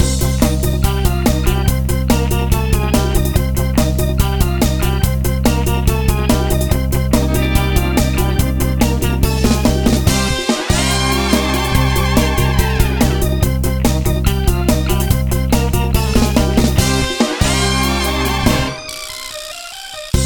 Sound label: encounter athlete; Manamon Music; Manamon 2